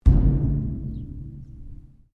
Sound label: Strike Metal Hollow Tank